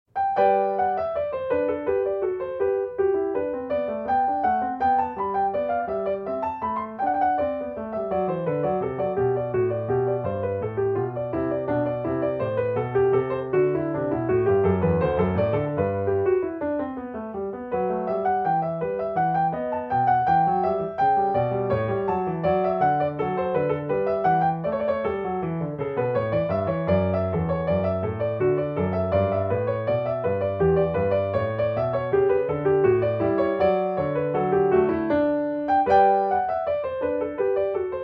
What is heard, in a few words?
French Suite No. 5 in G Courante; J.S.Bach